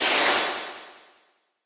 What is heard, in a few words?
Magic Missile; Idle Wizard